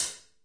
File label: Hat, Real Kit; REAL LIVE KITS; Multisampled Real Kit BJ-HD9